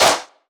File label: clap; bespoke - Floral v.1; drum oneshots